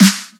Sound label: Futurejazzstep Snare